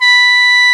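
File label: B3 SHENG, CHINESE SHENG; AKAI S6000 CD-ROM - WorldSounds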